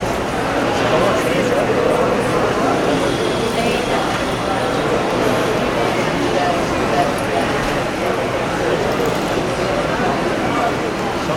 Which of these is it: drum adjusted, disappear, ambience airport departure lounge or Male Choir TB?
ambience airport departure lounge